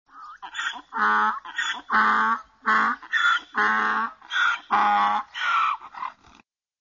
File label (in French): nature âne